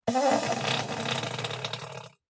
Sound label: Fart Noise; SoundsCrate